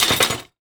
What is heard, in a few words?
Forks, household rhythm samples Hits